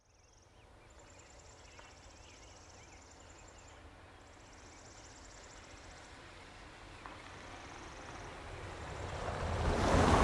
Vehicle Auto Pass By Dirt Road Country Automobile Car; Cars